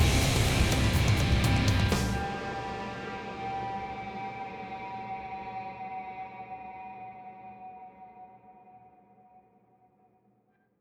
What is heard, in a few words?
Outro 125bpm 4ch; Battlefield Hardline